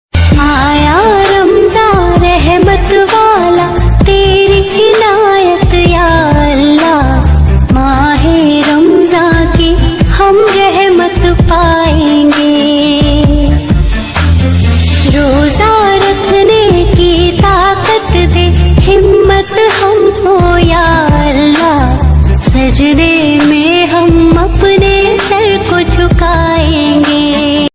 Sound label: islamic ringtone